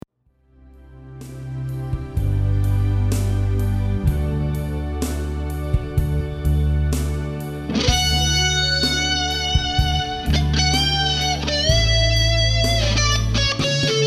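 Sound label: Earth Drive Comfy Solo, guitar; Earth Drive demo; SSL5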